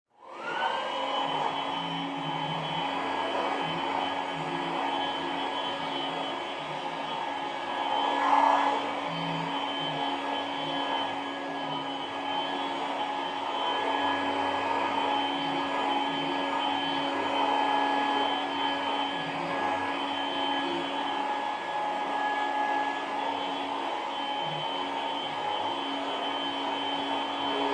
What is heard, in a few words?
Vacuum Various Move; fans